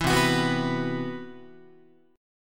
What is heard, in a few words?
C Major Sharp 11th, Guitar; CM#11 strummed; JGuitar